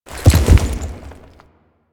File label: Horse Jump Land Heavy; Foley Actions; Warframe; Vehicles; Duviri